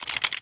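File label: inspect movement; Valorant; cstrike; ghost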